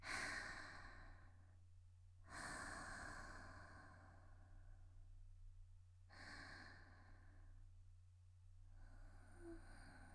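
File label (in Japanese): 少女「息」寝息20秒; 元気な少女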